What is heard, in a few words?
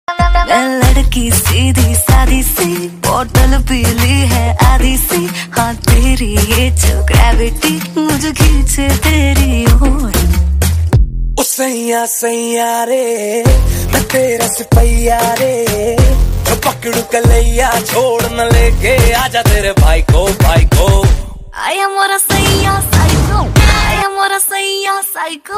Ringtone Hindi; Saaho